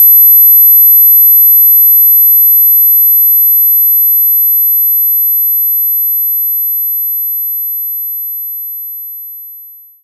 Sine Triangle 12000

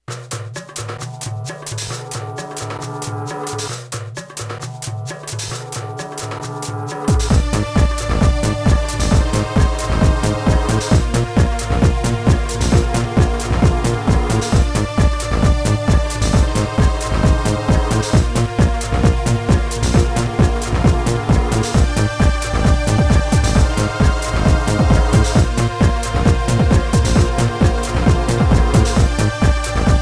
Cave; Flower, Sun and Rain

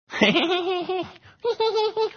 laughing; Oswald

